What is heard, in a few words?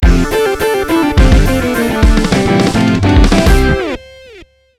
Wii Menu Banner; Excitebike World Rally